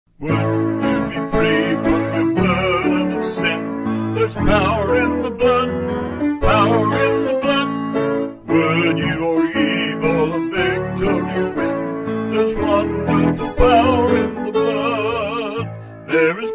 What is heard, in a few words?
Vocals Piano; Would You Be Free